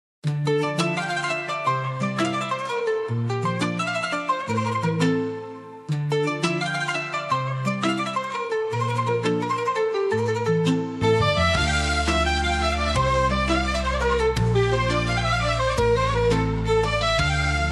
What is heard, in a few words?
Asian Rhythms